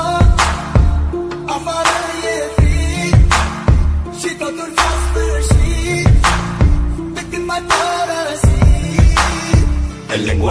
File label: love romantic english music ringtone